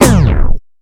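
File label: Turntable; AMG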